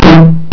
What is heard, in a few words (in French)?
TROMPE